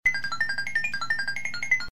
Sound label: Reels Spinning, slots